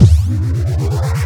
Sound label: charge short; CrossCode; battle